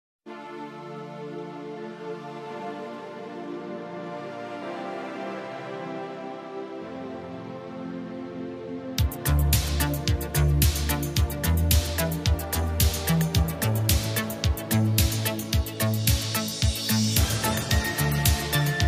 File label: 80s new euro italo disco